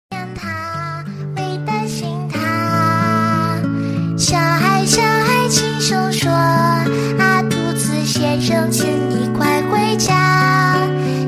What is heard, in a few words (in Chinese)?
童声唱歌